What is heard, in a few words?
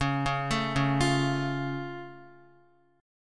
Db Augmented, down, Guitar; arpeggiated; JGuitar